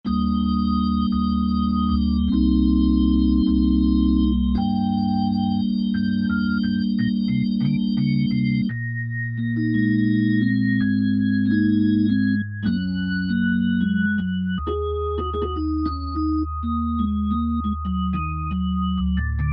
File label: B-3 Hollow Slow, Roland Keyboard Instruments; Roland L-CDX-02 Keyboard Instruments